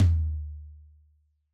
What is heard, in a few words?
Floor Tom H; Yamaha DJX-2B